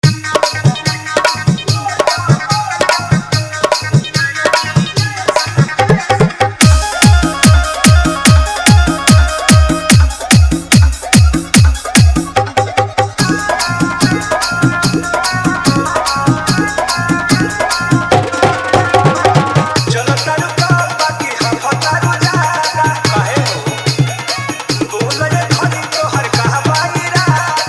Faadu Bolbam Mix

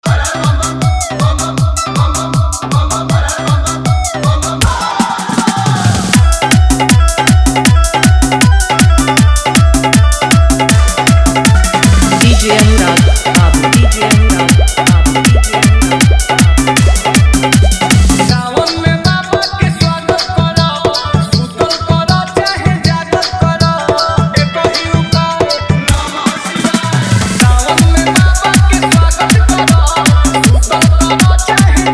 Bolbam Full Dhollki Bass Dance Mix

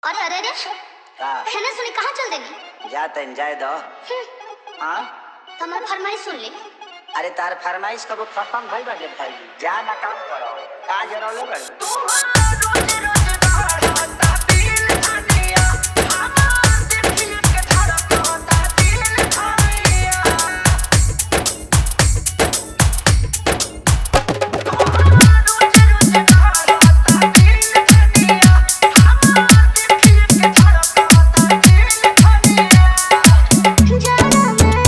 Hard Dhollki Bass Mix

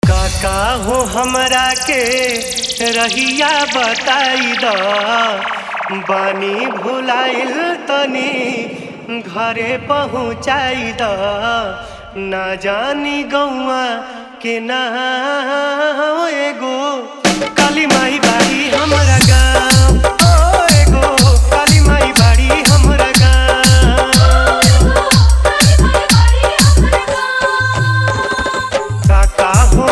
Bhakti Hard Dhollki Mix

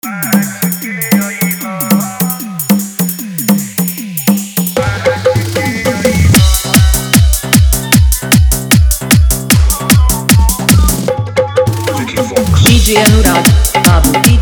Bhojpuri Dj Song Fully Dhollki

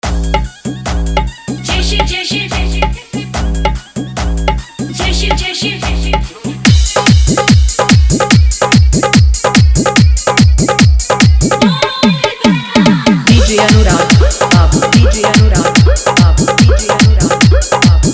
Bolbam Dj Song Mix

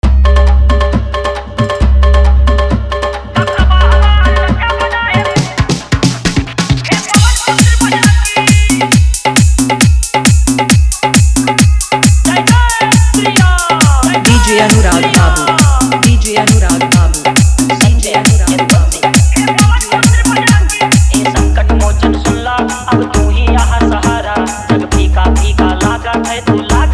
Bhakti Hard Dhollki Bass Mix

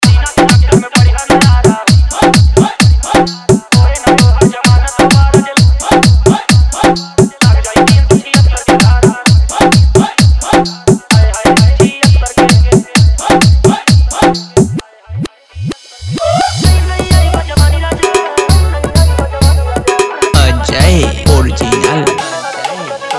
Bhojpuri Original Congo; Tahalka

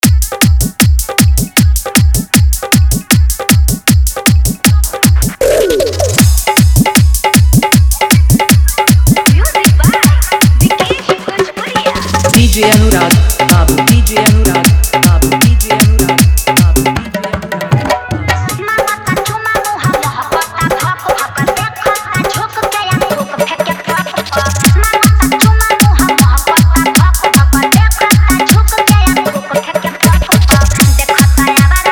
Bhojpuri Arkestra

